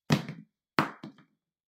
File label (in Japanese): スリッパを置く音; slipper